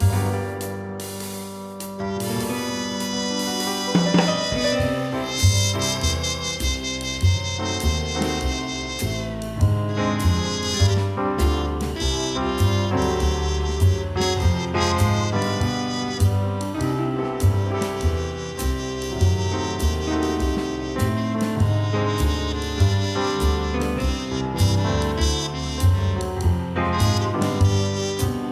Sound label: smooth jazz; LEXCORP; Lego DC Super Villains 2018 Nintendo Switch